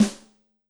Lite Snr, Yamaha DTXpress-II snare

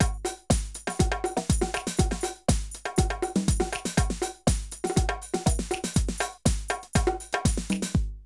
drum loops, House & Garage; Zero-G Groove Construction (1993)

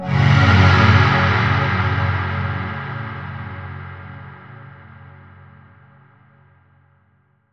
Darkness Pad; Zero-G Sound Sense Grime Scene; 90 s Sample CDs

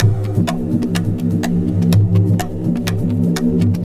Tabla, 125 BPM; Zero-G; Pure Tabla

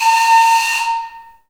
AF FLUTES, Heart of Africa

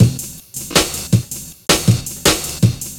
Downtempo Lag, Drum N Bass; Sonic Foundry (Sony Creative Software); Knutrix Drum & Bass

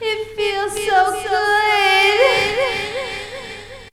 SEX; Zero G; Man Machine

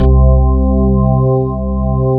ALL STOP, CHURCHY

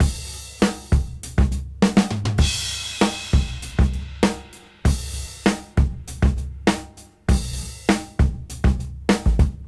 Break 99 BPM; AMG; Keith LeBlanc Phat Butt Jams

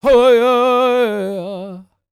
World Voices, CHOCTAW

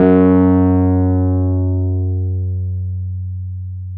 PNO T.Wurly F# 3; Keyboards of The 60's and 70's

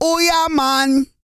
REGGAE, Vocal Planet World Voices

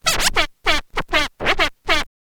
scratch kit, vinyl; Masterbits; Vinyl Attack

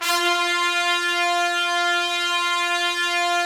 Trumpets, BRS TPS 27R; Roland - LA Composer vol. 4

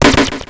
grind, EMU XL-7

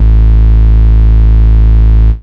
attack, BASS, Techno Trance

